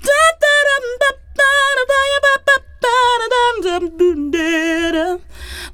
FEM VOX, Hip Hop; E-MU Formula 4000 Series Vol. 1; Hip Hop Nation; Phatt Phemmes 1

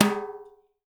BRUSH TIMB; Spectrasonics; Liquid Grooves 1 & 2; BR.TIMBALES

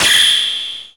PERC FX, Dance Drums; Best Service; Dance Mega Drums

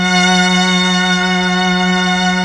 STR, M400; ILIO; Propeller Island Legendary

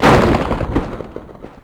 THUNDER; East West - Global Sounds; RAIN

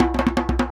conga licks

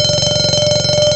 ALARM, BELLS; M8; CMI Factory

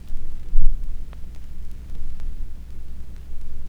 Fx Vox; Equipped Music; Loungin House

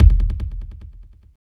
KICK, Tekno Industrial; East West

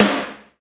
POWR SD, POWERDR; AKAI S6000 CD-ROM - Volume 1; DRUM RYTHM

